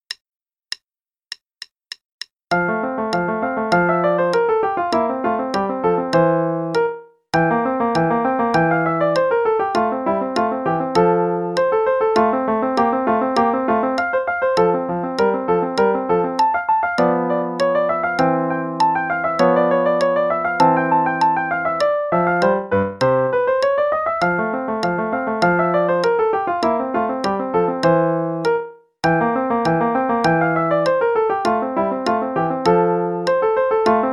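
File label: Play-a-long, Sonatina in F, quarter note=100; Beethoven; with repeat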